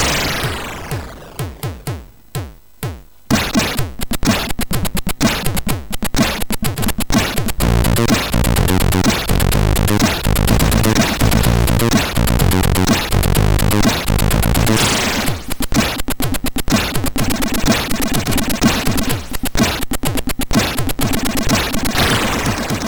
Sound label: Magic Funk MOS6581R4; sid